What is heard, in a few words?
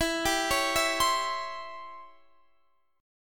C sharp Minor Major 7th Flat 5th, chord